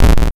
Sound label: soldier die; tanks of freedom; units